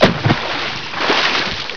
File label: splash